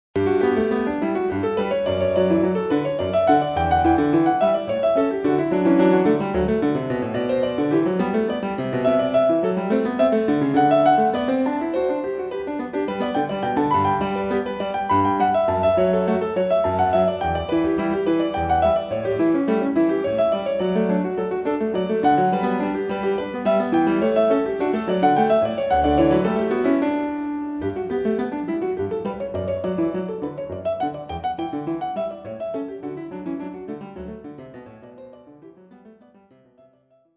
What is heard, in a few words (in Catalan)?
Piano Sol; Variacions Goldberg